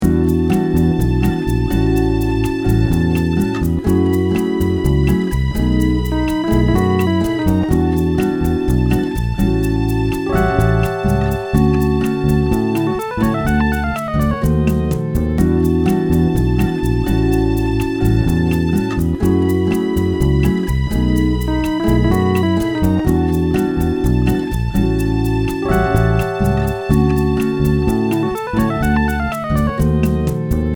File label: Bossa; Contmp Grv